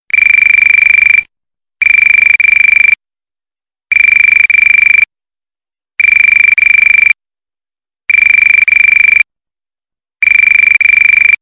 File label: Trimphone